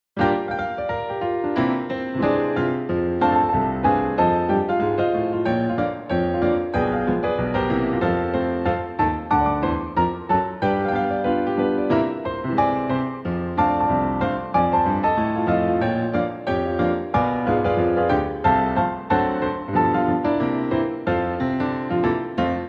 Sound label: Music Only